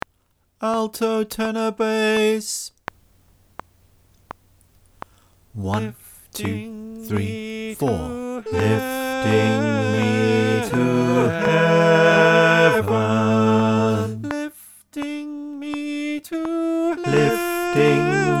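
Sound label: bass click track, choir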